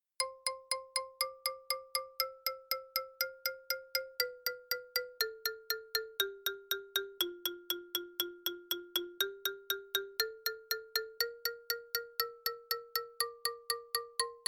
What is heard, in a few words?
Scales Hell; pit